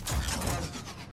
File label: Gear Shift